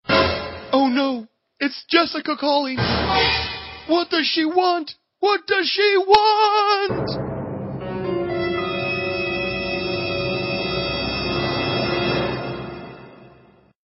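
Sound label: Is Calling R&B, ringtones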